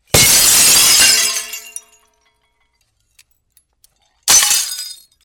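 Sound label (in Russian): разбили витрину большое стекло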